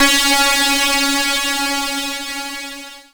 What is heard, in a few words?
C#, Synclav FM PLUCKED; Big Fish Audio